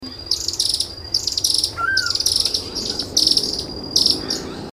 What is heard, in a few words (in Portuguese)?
Ferreirinho Relógio